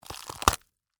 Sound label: Crunch Green Pepper, Rip, Open, Vegetable; Texture